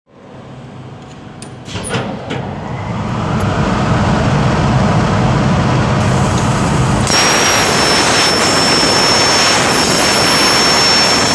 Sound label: plasma